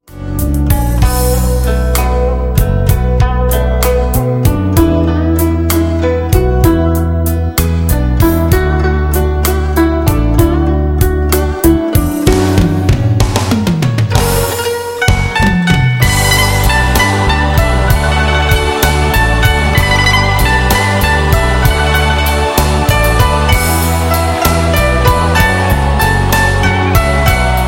Scratchin

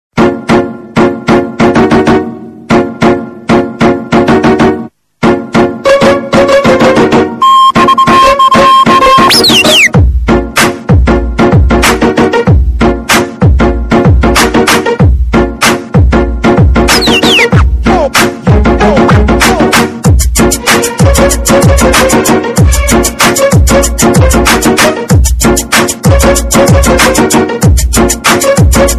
Bass Ring

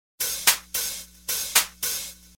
jazz sms, ringtones

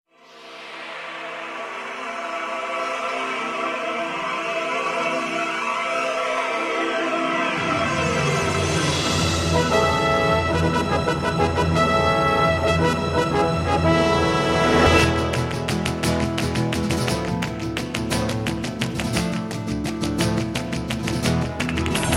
paso

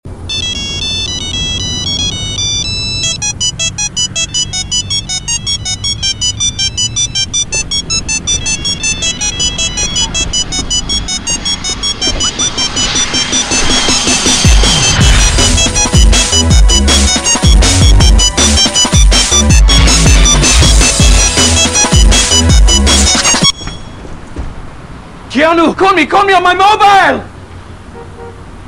Nokia Funky Synth

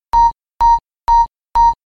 Capture Beeps

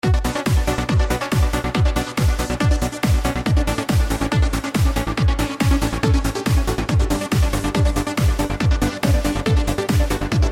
TRANCE

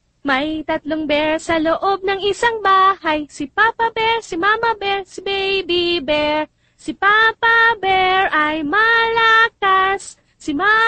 tagalog 3 bears

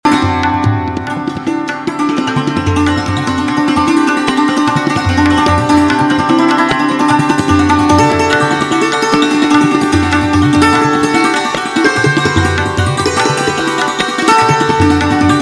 santoor; ring; classical

